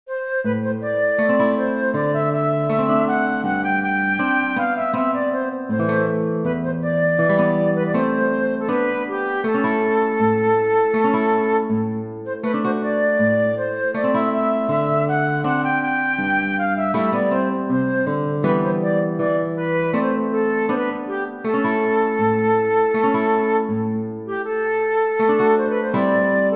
romanian folk dance for flute guitar

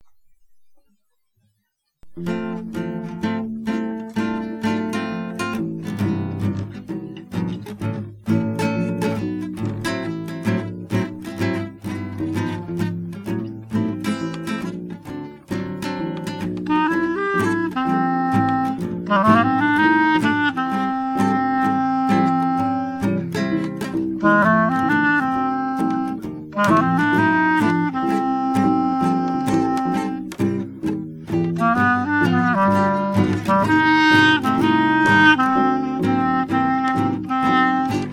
blues

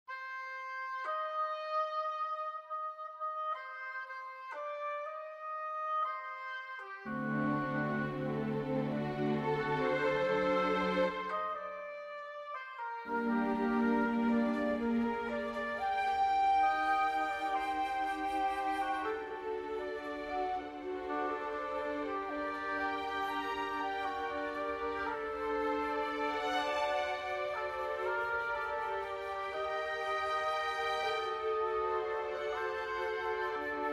recitative for flute oboe strings; mendelssohn bartholdy felix; mein gott betrn ist meine seele

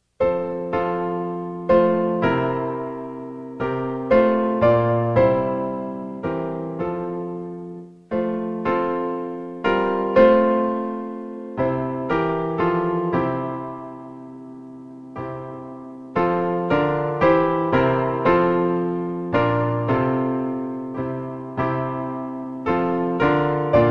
chorale; malan cesar

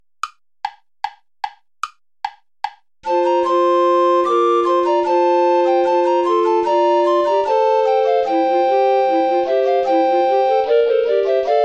ballet play along without bass recorder; praetorius michael; ballet des baccanales

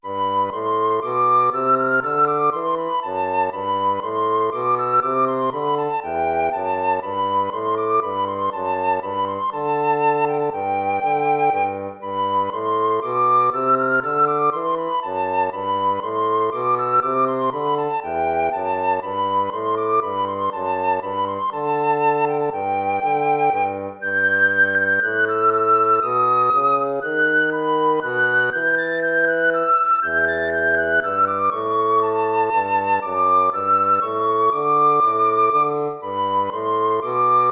baroque minuet major

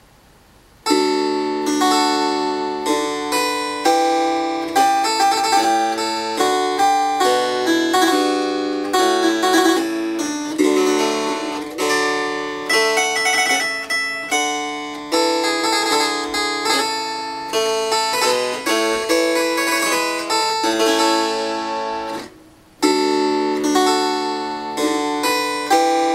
sarabande; buttstett johann heinrich

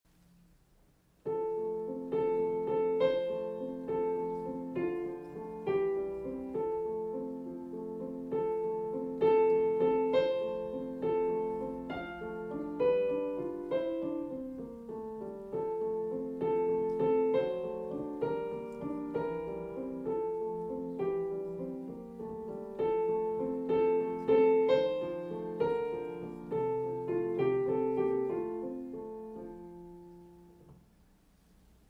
melody; hler louis

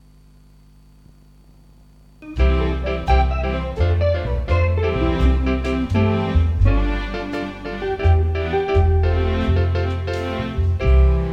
jazz; ascension

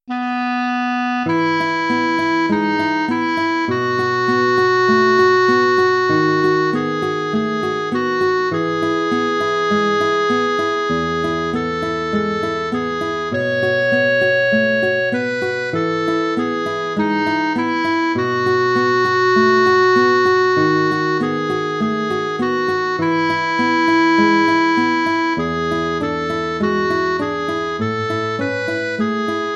etude major; chopin

